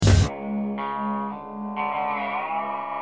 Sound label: riff